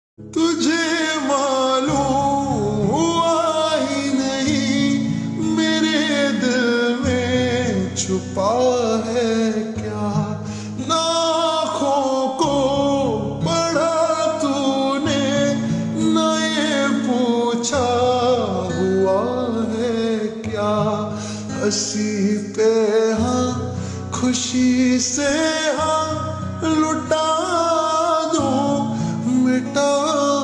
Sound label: Slow Reverb Version Ringtone